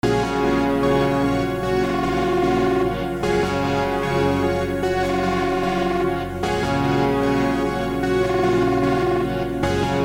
loops; roots; serum 2 bank; barelyworld; rodmadeit

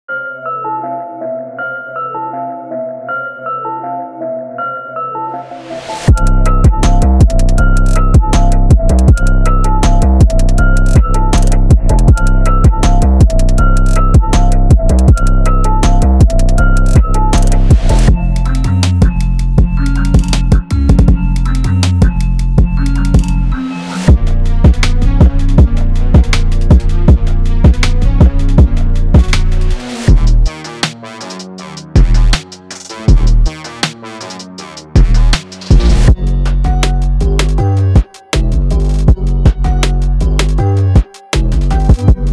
trap sample pack; street legacy

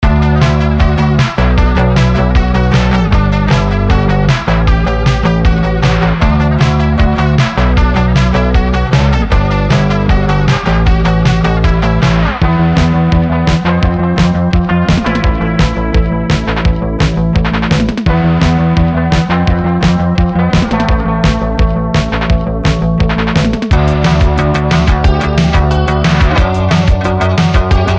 post punk sample pack; post punk 4; prodkult x dutchrevz

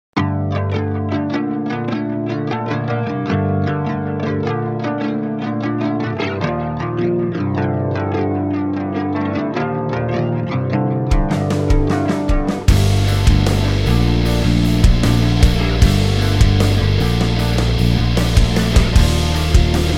loop kit; hologram; noria

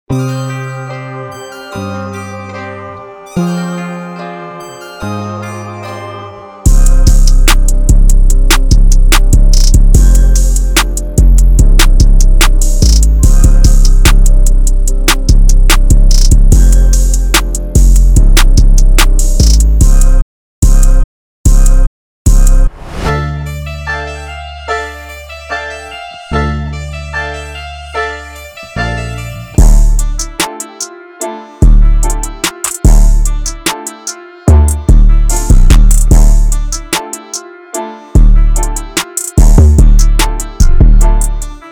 hard analog trap; the44thfloor

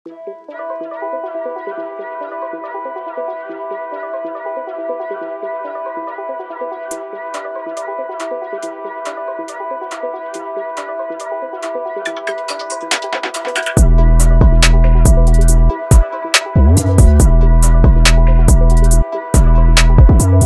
purple trap sample pack; traktrain

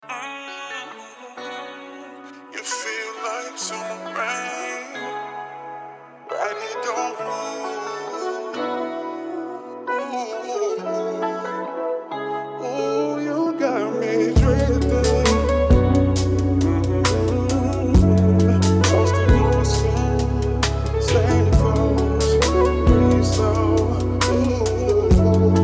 rnb soul sessions; elsewhere

